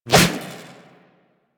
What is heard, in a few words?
Electro Prod Whoosh; Warframe; Weapons